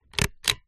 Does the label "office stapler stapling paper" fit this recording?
Yes